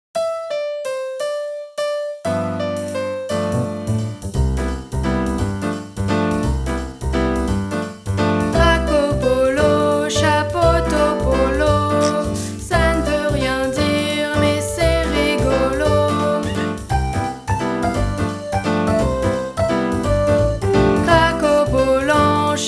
Cracopolo chant, Version chantée

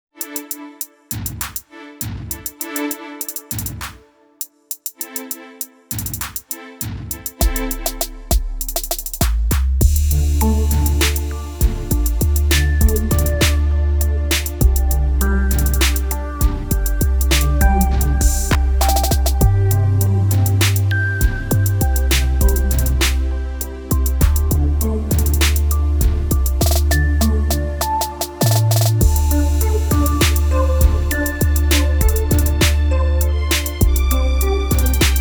Vive le vent PB sans claps

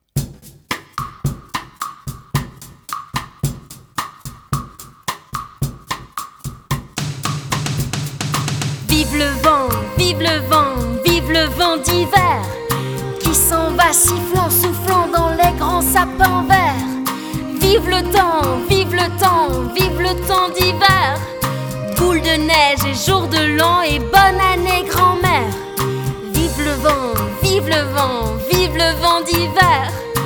Vive le vent chant, Version chantée

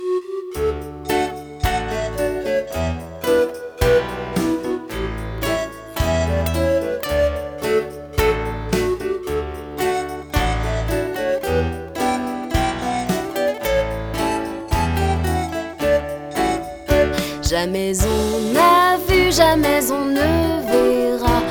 jamais on n'a vu CH

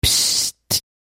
Pcht, onomatopées; Pchitt... (eau gazeuse); LES ONOMATOPÉES

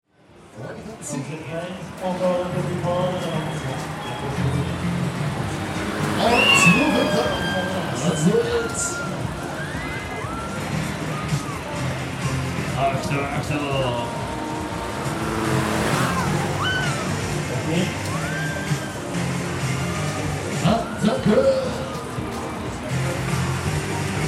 Fête foraine, Les lieux

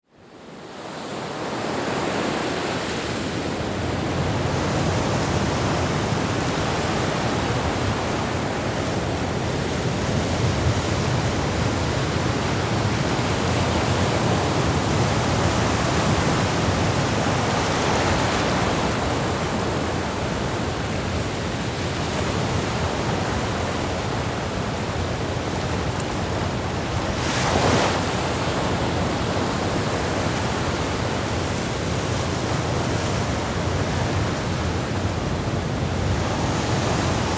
Mer, Les lieux

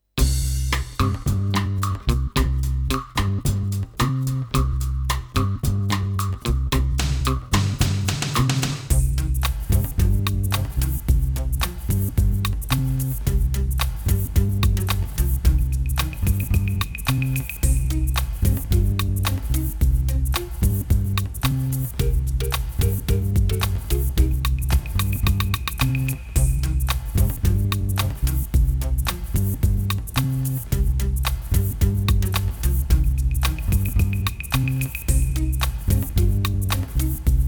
Vive le vent PB sans claps

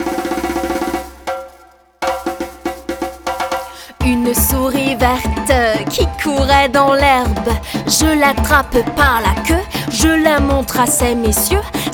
Une souris verte, version chantée

